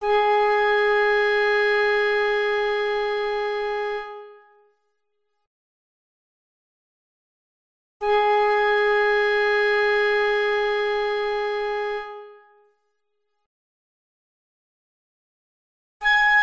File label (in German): Stimmtöne A